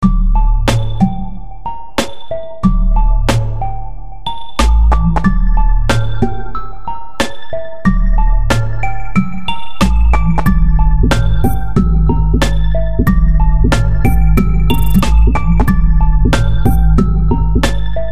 beat

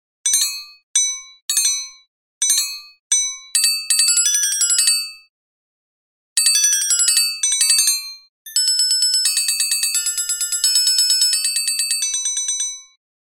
orchestral mallet crotales

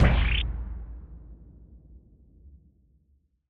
galaxy taiko

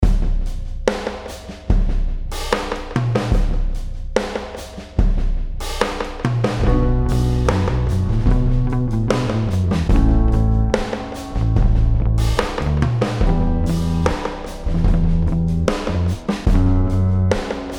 truck drums

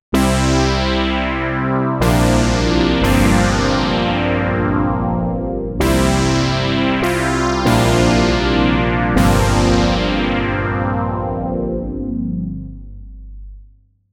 OBX lush sweeps; opxpro3

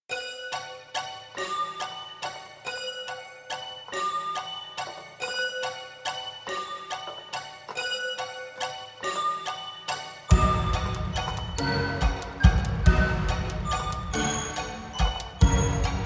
waltz

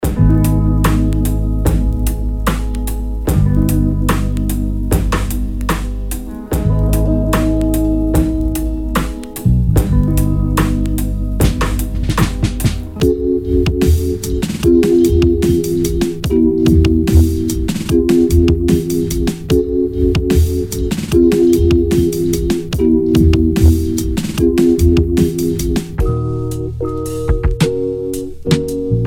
vintage hiphop breaks

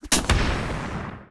exocet explosive dart

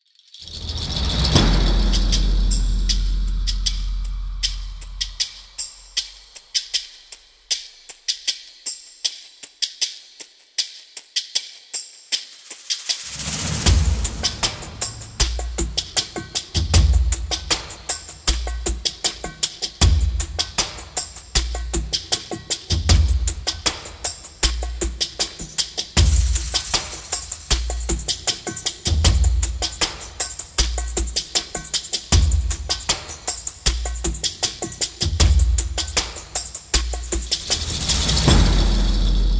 cinematic

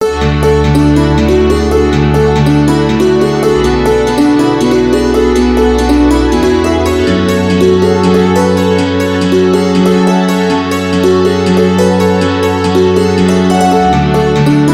dulcimer; rdgaudio

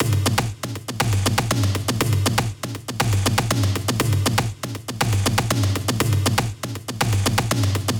machine tribes loop main hits

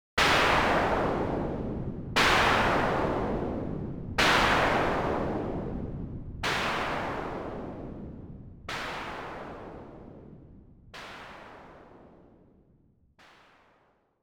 start engine; opxpro3

